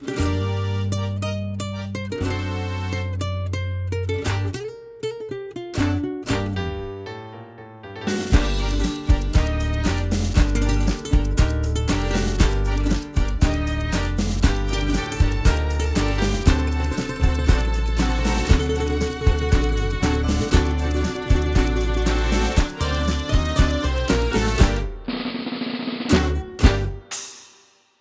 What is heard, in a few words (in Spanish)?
Tiempo de Tango